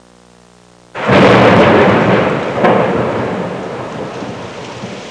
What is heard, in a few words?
thunder, Nature